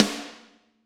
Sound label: Snare Crack